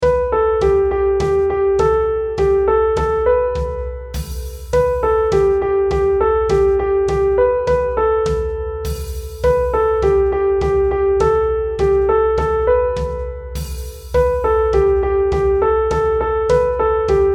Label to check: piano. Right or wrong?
Right